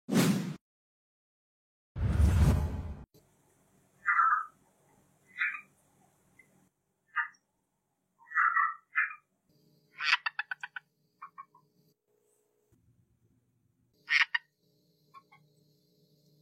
red eye tree frog calling

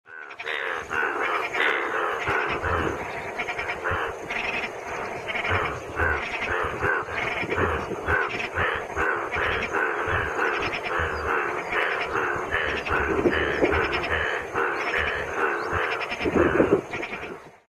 Hoplobatrachus rugulosus calling

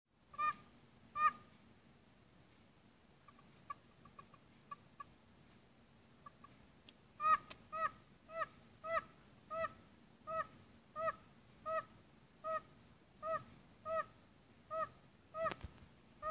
Bombina Orientalis calling

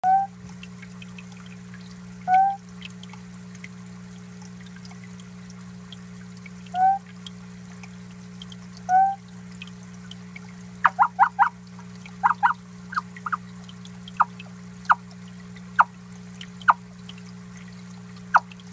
Theloderma corticale calling

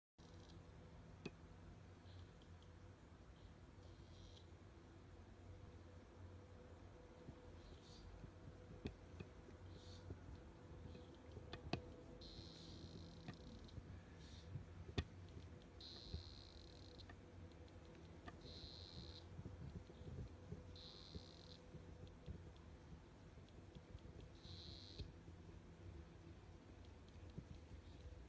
ranitomeya variabilis southern calling

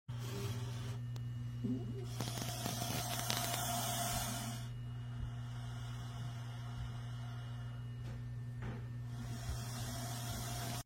dwarf caiman

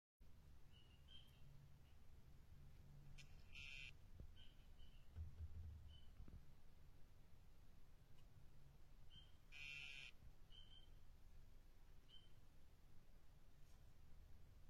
dendrobates tinctorius tumucumaque calling

